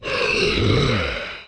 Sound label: zombie attack scream; The Monster Plane; kolmods preatt